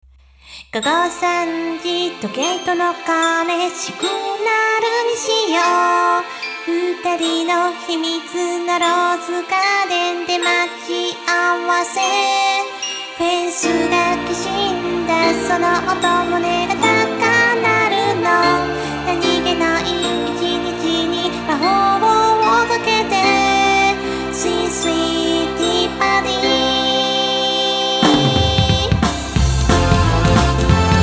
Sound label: song